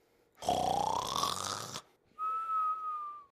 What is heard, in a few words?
character walrus sleeping; Fishing For Gold